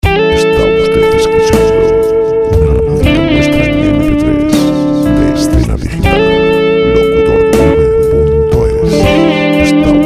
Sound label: rock lent; Música "rock"